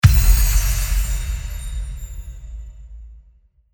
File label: UI Big Pop Up